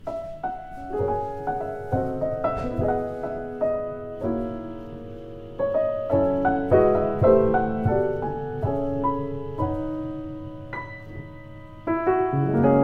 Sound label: Yamaha G3, piano